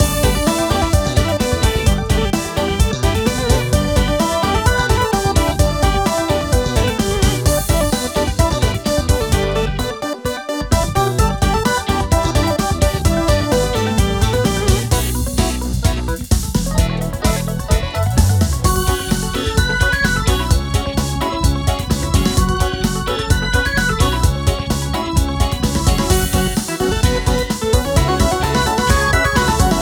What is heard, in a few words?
Retro arrange; Shanghai I Melody-Reflection; mahjong solitaire refresh